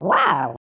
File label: correct; literki dzwieki